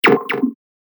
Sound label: Strange Plop Sound, cartoon sound effect; Game Fx; Cartoon Sound Design Sound Effects